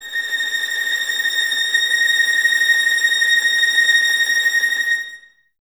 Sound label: Violins Tremolo A#6; Miroslav Vitous; 11 Violins